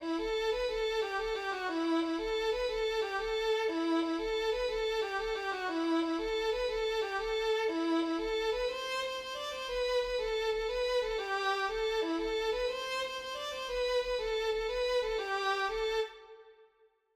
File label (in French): violon